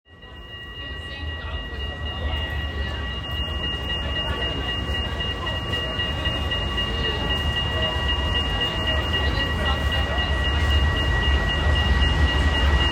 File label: Tram